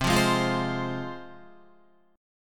C Suspended 4th Sharp 5th, C, down, Guitar; Csus4#5 strummed; JGuitar

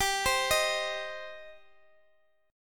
G Suspended 4th Sharp 5th, Guitar, DADGAD

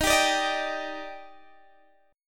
Eb Major 7th, Guitar DADGAD

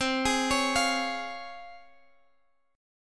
Db Major C; Db/C; arpeggiated

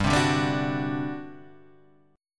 G Major 7th Suspended 4th, G

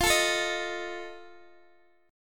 F 7th F down Guitar Open D

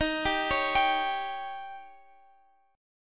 C sharp Suspended 2nd Flat 5th D sharp

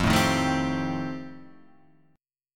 F 9th F, chord

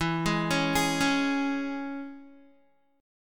E 6th, Guitar; arpeggiated; JGuitar

